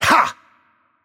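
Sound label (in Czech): Attack Heavy, Czech; cs CZ; VO; bloom minigame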